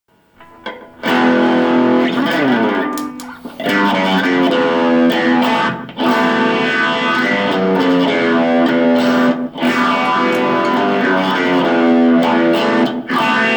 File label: Maestro PS-1A dirt, Flanger